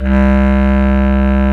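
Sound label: Bass Clarinet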